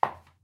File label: heels clack, Footsteps